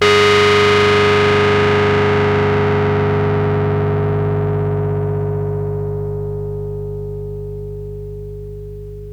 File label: REZ RHODES; PlatinumPhattCD2; E-MU Producer Series Vol. 8 – Platinum Phatt